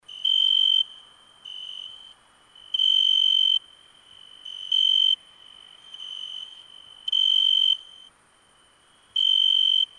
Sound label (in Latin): Oecanthus pellucens; BIOAC ORTH